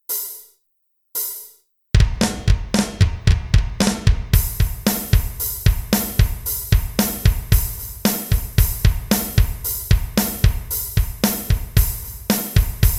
drums; smorgasbord

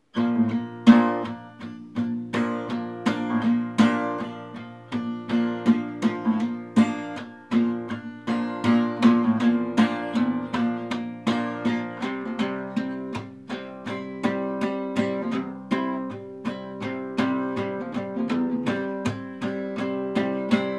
acoustic; brick and mortar; burgerim

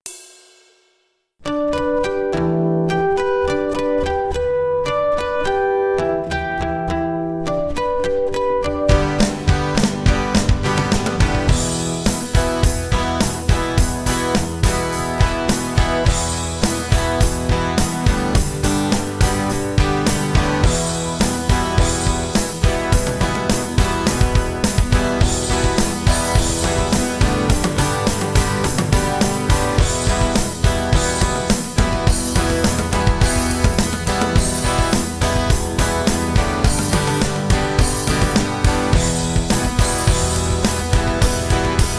acoustic; onelivingchurch

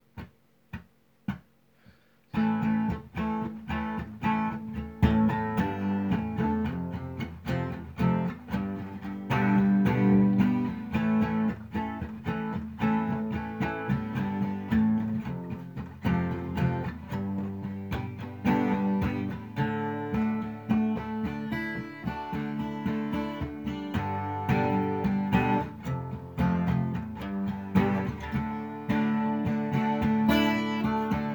acoustic; brick and mortar; zara led lights

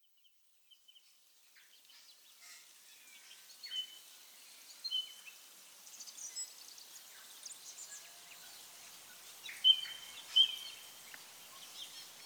ambiance karaoke; smorgasbord

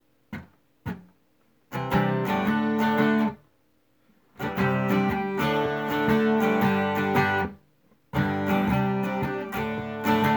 acoustic; brick and mortar; whistlestop coffee and moore

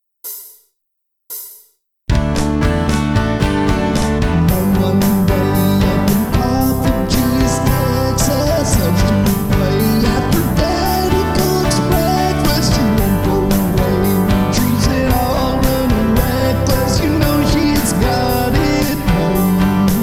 song scratch; smorgasbord

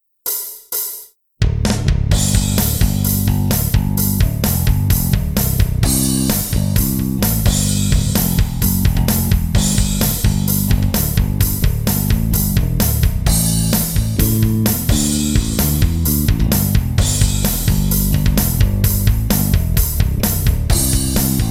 bass guitar; brick and mortar; start up optical

